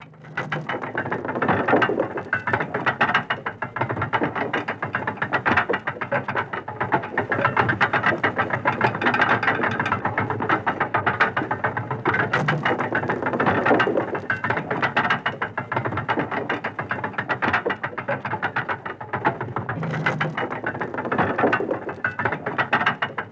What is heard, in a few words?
Drawbridge Raised Slowly, Chain and Pulleys